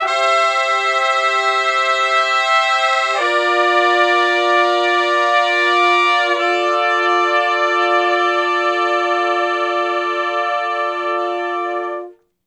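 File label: Slo Minor Blus Chords (V,IV,I,I) (D), horns; Sections; Crimson Blue and Fabulous Horncraft 4 RnB; Horncraft for R&B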